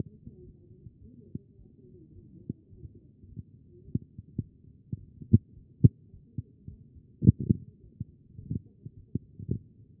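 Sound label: anterior chest, position 4; B MODE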